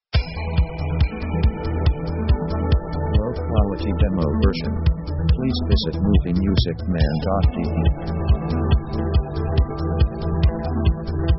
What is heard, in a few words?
140 BPM Trance; synth themes